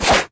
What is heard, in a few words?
jackhammer down swoosh; video games; player; taunt; source engine; tf